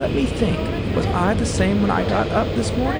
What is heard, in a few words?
noisy